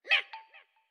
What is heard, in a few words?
Skeleton Aggro